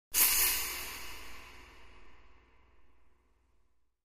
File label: Impact Thin Pointe, high thin impacts